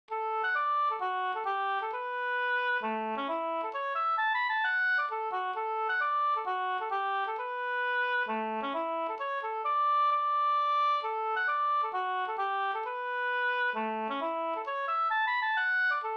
hambopolska

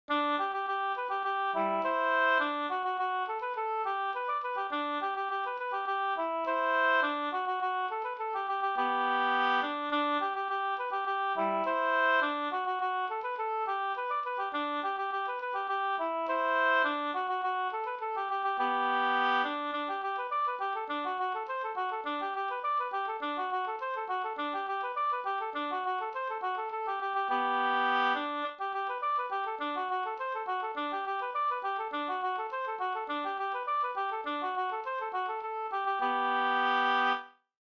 polka polkett; fr Dannemora e C o H Wallin

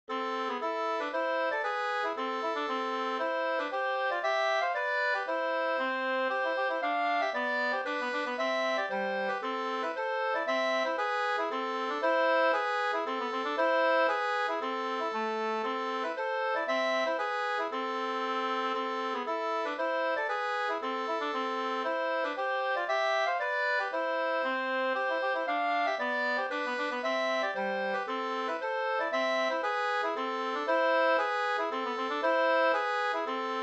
polska

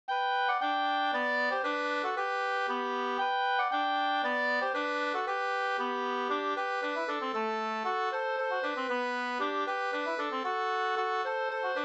slangpolska 2st

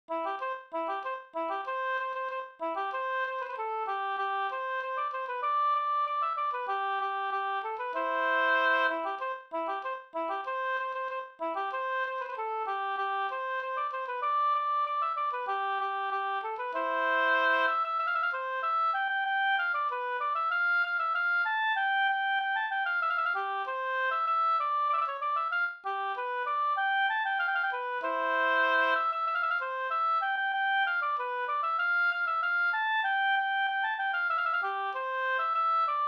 polka polkett

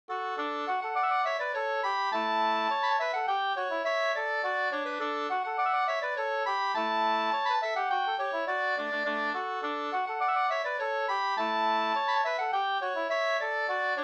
skanklat; Stekmarsch

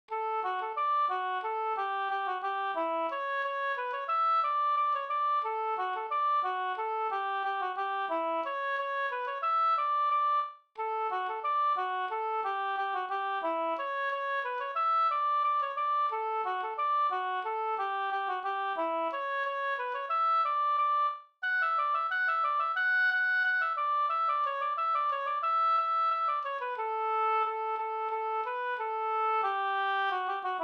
polka, polska 2-takt

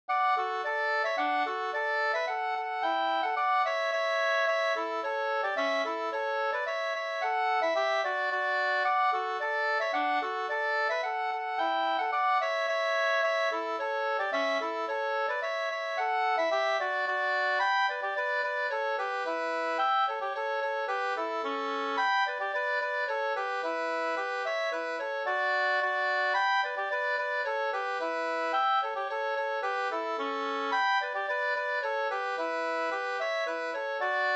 folkdans, Tretur no; Strandvik

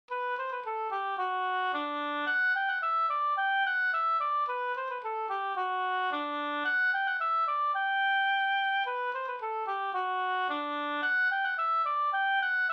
folkdans, Pariserpolka

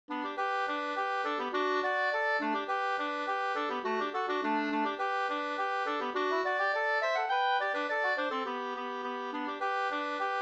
engelska; ur Lofgrens saml; Stockholm

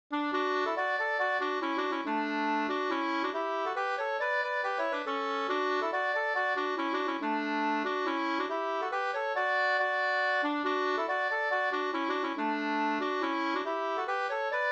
polska hambopolkett

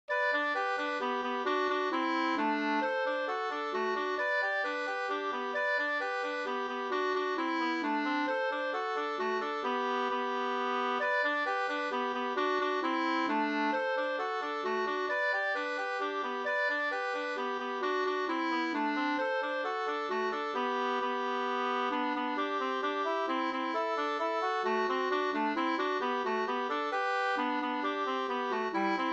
mazurka, 2st

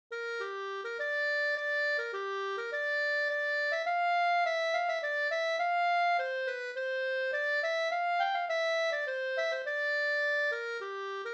polska senpolska

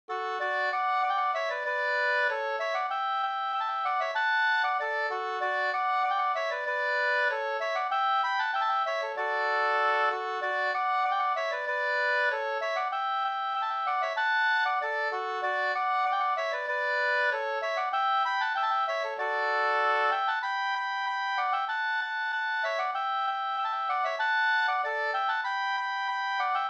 ganglat, marschen; Varmlandsnas

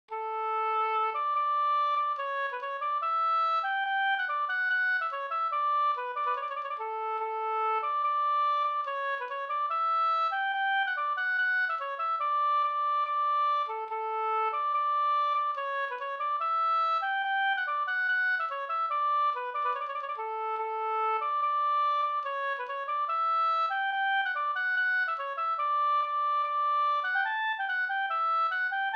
reinlender

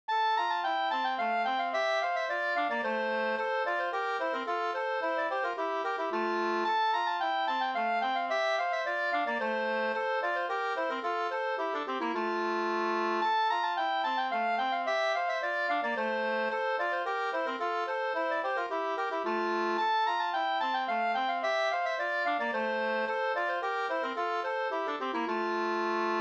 polska Bingsjopolska Brannvinspolska; e Pekkos Per

